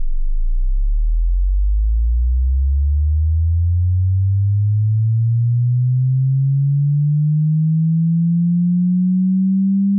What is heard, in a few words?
freq 20-200